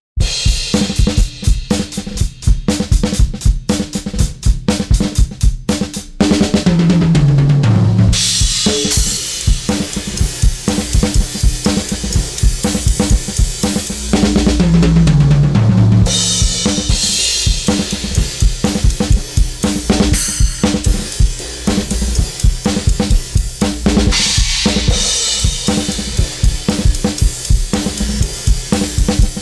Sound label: drums bypass; Autoformer